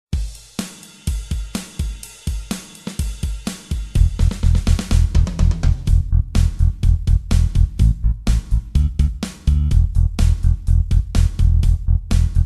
rock bass drums

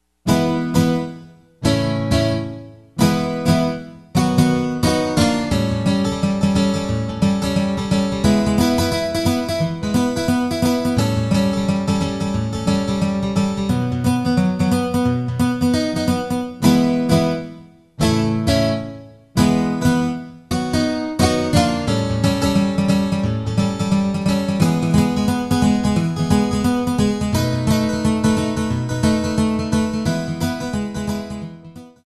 country acoustic guitar

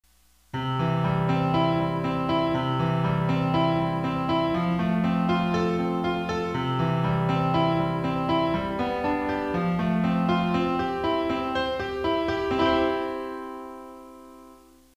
i iv and v no blues

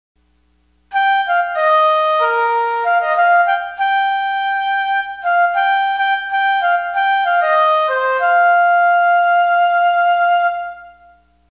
tone flute solo

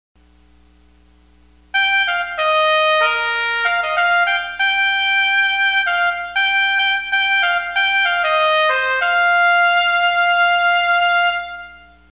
tone trumpet solo

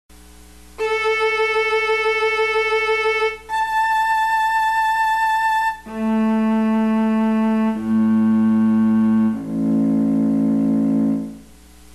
pitch series of As on strings

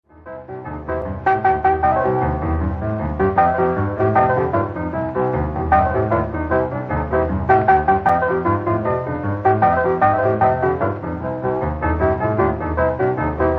blues boogie woogie piano

